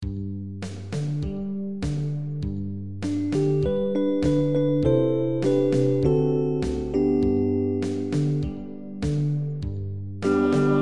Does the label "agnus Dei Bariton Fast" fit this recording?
Yes